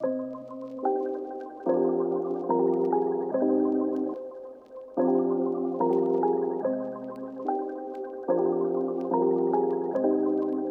Various Melody Loop - 145 BPM C Min Bells; Melodies; Loop Stems; Cymatics; Oracle Sample Pack

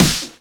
Clap; Ghosthack; Free Male Vocals and Atmospheres; Bonus Drums

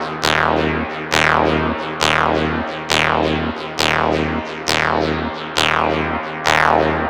Synth Loop D# 135, Techno; Ghosthack; AC2024 - Day 1 - Techno Sounds 2025

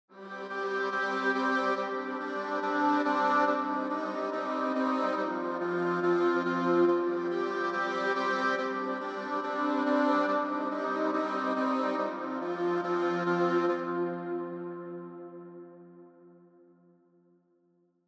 141 BPM C Min Strings; Cymatics; Red Eyes; 2022 Melody Collection; Drill

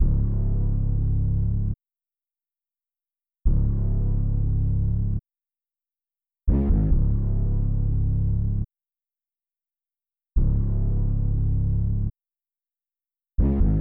139 BPM C# Min Bass; Melodies; Loop Stems; Cymatics; Monarch Melodies; Orchestral; The Mist

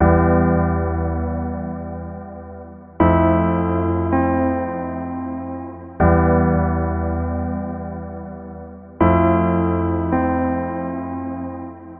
Suitase Keys Em 80Bpm, Granular Lo-Fi; Granular Musical Loops; Ghosthack Advent Calendar; Lo-Fi Kit

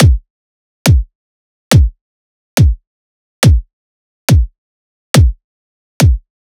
Riddim Drum Loop Kick (140)

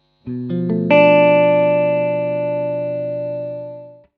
Guitar One Shots F#°; Guitars; Ghosthack; Advent Calendar 2019; Xmass

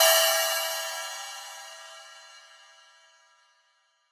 Ride; Hats and Cymbals

